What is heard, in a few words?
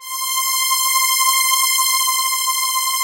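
string synth C5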